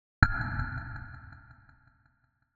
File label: Stressy